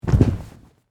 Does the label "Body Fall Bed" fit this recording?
Yes